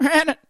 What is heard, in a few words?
win, goat